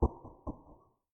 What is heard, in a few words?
footsteps; Five Nights at Treasure Island 2020 Remake; windows